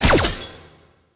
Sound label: Chain Ringtone